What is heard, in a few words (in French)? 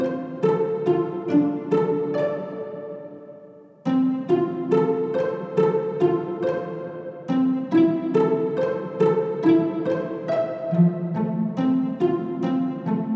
Violon; Type:Rider; PS Vita; Didot Violon Cour